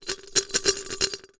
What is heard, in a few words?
Foley Metal Clamps Glass Bowl Rattles